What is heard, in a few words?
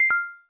cam stop; LG; G-Pad-II-8.3-LTE-P815L